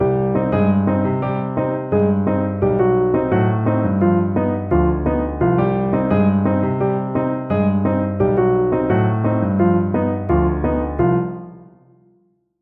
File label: Latin grooves, piano